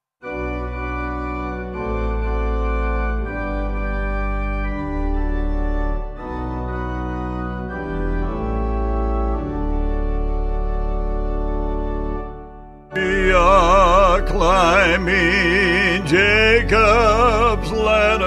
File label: We Are Climbing Jacobs Spiritual Vocal Organ